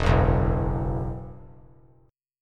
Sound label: E Suspended 2nd, down; Esus2 strummed